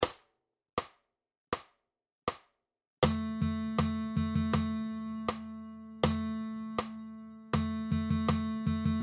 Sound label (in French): Dictées de rythme, guitare